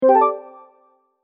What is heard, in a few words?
SE sys cyber ok